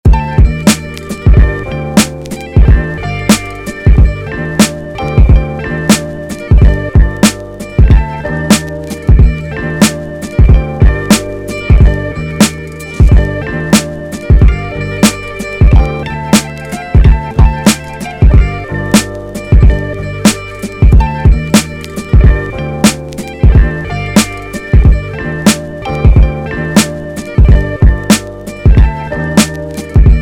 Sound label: Beat